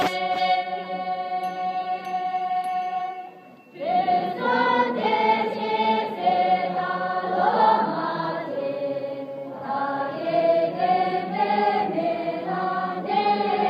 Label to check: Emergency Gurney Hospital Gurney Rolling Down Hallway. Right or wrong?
Wrong